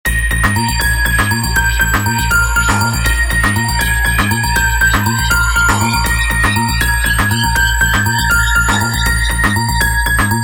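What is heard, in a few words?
Morning Wolves, ringtones; Sony Ericsson; PlusFourSix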